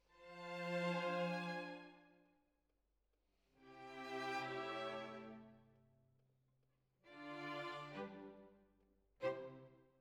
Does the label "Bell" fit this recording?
No